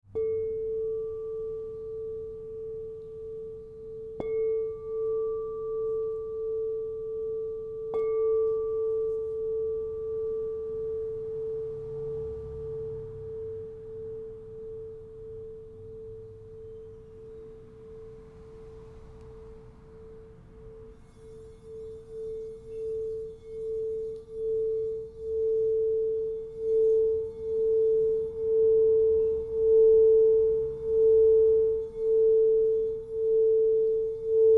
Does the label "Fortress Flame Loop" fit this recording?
No